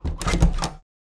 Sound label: Door Bedroom Open